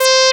Synth Sound; Synth Shots; Vengeance Samples

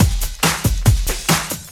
Breakbeat 140 BPM

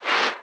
BD Gate FX, Bassdrums; Vengeance Samples

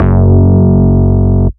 Ultimate Bass Root F#; Vengeance Samples

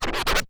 Cuts, Vinyl Record Samples; Cuts Medium

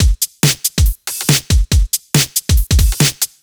Breakbeat 140 BPM

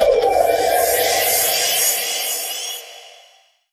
Uplifter

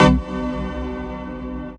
Synth Cuts; Vengeance Samples; VES1 Instruments

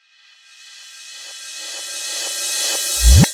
Sweeps Medium

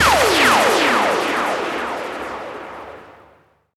Downsweeps

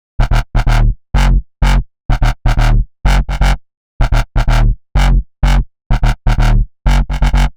126BPM Bass Root D#; Vengeance Samples; Lineup Kit

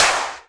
Clap; Vengeance Samples; VEC1 Claps

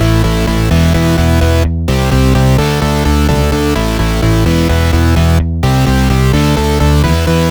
128BPM Melody; VFH1 128BPM Diesel Kit

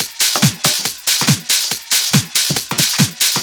Loop 140 BPM; vengeance essential club sounds-3

